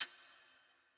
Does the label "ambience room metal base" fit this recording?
Yes